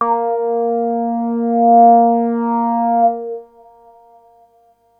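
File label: bass A#2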